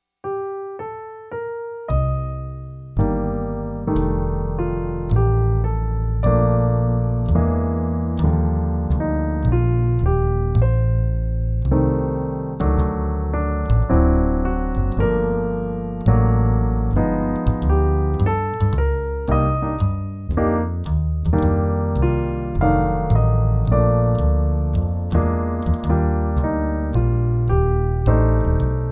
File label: LH rootless melody plus bass walk, jazz voicing, keyboards; jazz voicings; the well-rounded keyboardist